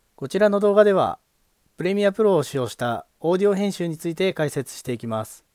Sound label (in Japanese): ピッチシフターセント100トラック適用